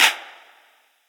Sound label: huge clap single hit g sharp key; single hits one shots